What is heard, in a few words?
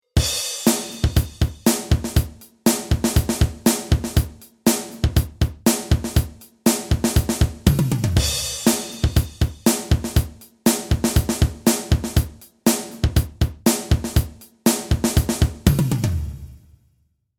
hypercanvas drums